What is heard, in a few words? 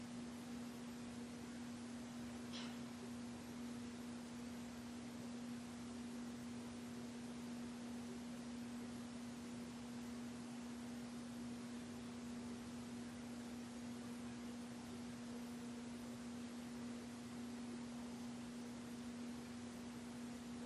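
Ballroom Static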